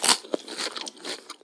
eat sitting

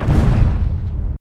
stomp

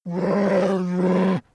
growl

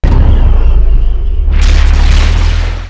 arm

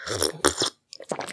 drink

